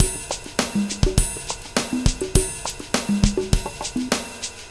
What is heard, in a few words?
drum; SMOOTH 03 C